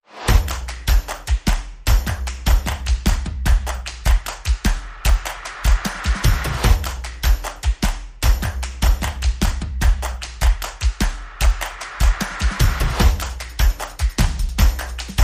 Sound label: Perc and FX Only